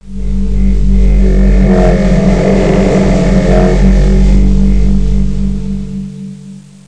Alien Skateboard; Let's Discover Massachusetts

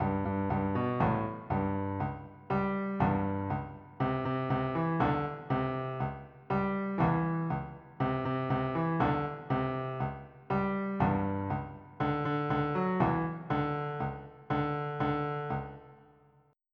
Reggae; Massive MIDI Collection

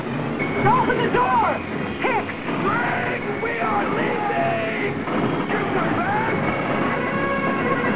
alien

